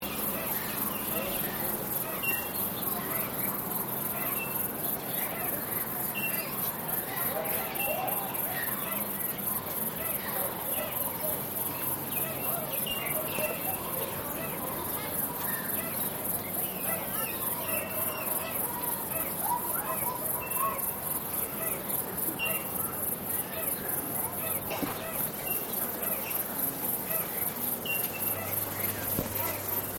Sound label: AMBIENCE PICNIC AREA BIRDS INSECTS PEOPLE LOOP; Victoria; Regional Ambience; Outdoors